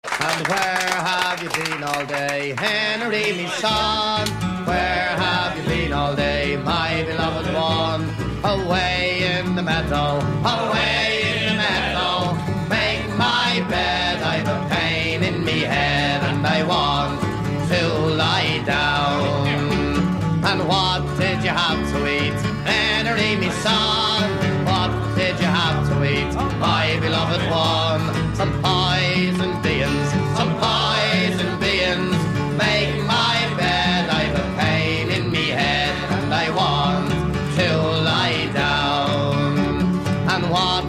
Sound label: N. Irish Drinking Songs